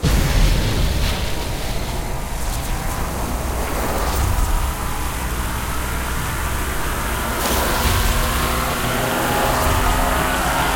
near Win Riser; archangels

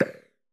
Bullet Puff

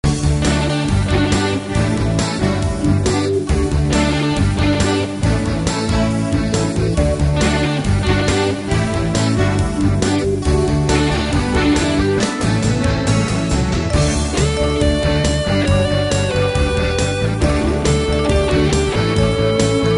music; BombIt5

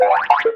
tablet

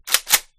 svd switch, guns; resurviv

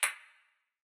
Bullet Bounce

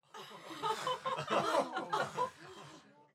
crowd Chatter brothel; spellbound

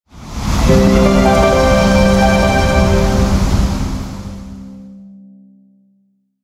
change scene; ThreeHeadedDragon

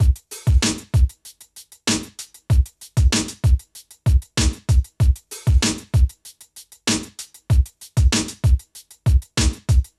DRUMS, viral hiphop

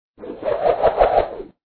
ropes whoosh; bubblespinner; html5 games

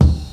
kick, electronic

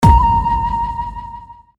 hit

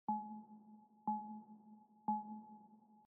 countdown

